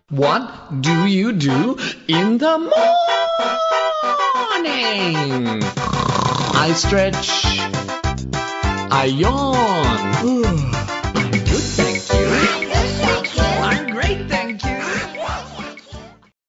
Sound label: song